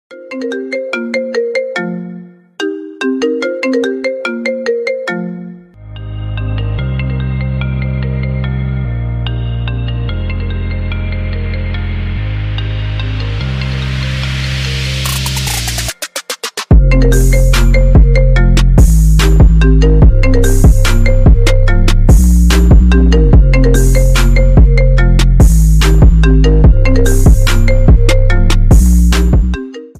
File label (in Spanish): iphone, tono android